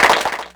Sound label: Clapbox Pitch; Ableton Foundation FreePacks